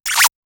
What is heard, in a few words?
futuristic bullet sound